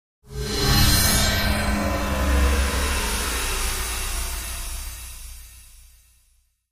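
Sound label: Wash HF Elect Feedback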